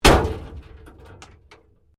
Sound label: Wreck Door Panel Clunk Metal